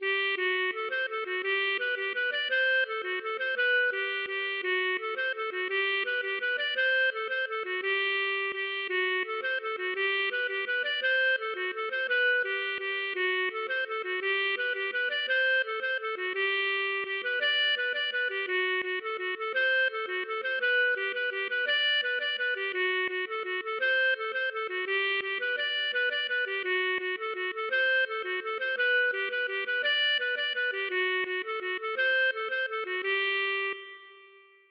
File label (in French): bourree Droite Du Morvan